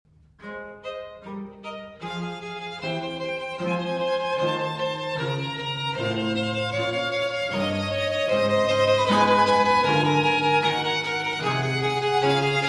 Sonata For Violin and Basso Continuo, A Major, Vivace. Gigue; in A Major La Guerra VIII.